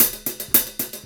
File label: Hi Hat